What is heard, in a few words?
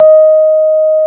D#4, Electric Pianos; Wizoo Electric Pianos